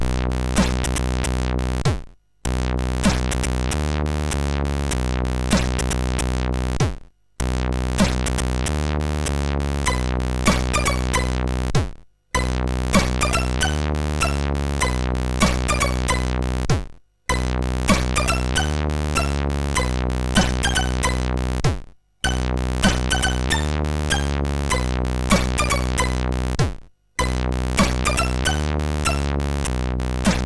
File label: Strange Tune, MOS6581R3